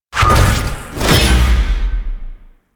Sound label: Teleport Cast; Enemies; Warframe; Pax Duviricus